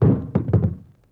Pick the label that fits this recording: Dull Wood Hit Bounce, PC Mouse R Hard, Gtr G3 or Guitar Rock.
Dull Wood Hit Bounce